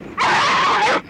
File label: Monster Scream Nasty, bears